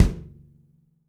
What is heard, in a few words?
kick, gretsch; Dirt-Samples